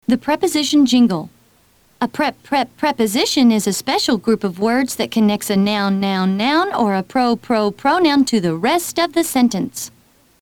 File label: preposition jingle